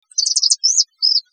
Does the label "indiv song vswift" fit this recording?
Yes